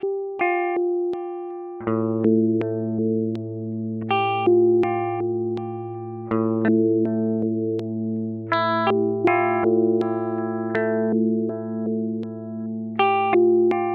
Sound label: Ottobit Filter Sequencer